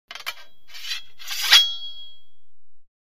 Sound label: The sword is taken out of its scabbard; cold weapons